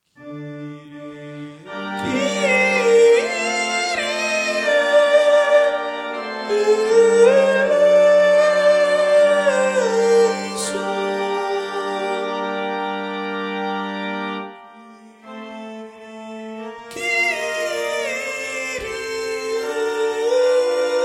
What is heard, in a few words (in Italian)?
kirie soprani